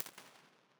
Large Dome - long response, sparse reflections in time